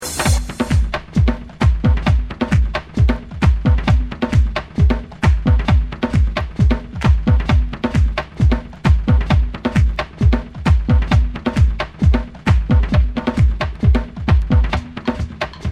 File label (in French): Musique Africaine Percussion; World Music